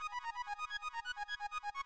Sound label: wistle thing